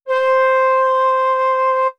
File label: c flute